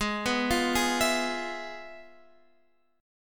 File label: F Minor Major 7th Flat 5th, G sharp